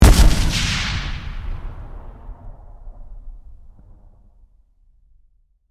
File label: Explosion Complex, Blast; Warfare; Bombs; Explosions; BlastWave